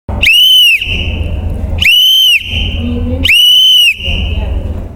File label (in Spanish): Silbato